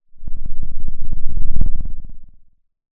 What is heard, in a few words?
Sound of a lion’s roar